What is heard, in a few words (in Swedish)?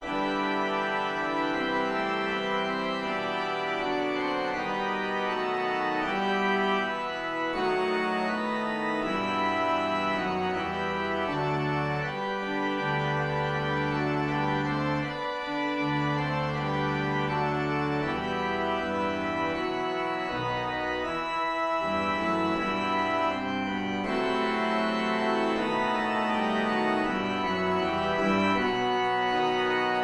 Ukraina Nat sång